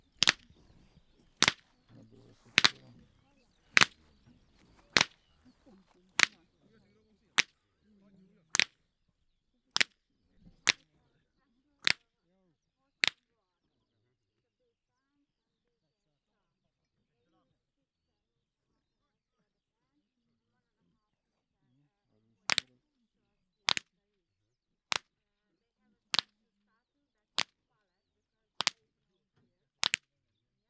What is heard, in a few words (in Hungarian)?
ketszeri pattogtatas, karikas ostor; hortobágyi puszta